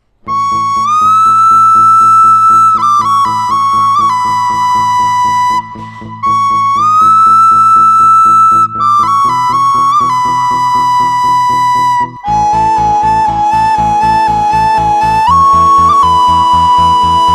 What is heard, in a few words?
Flute Theme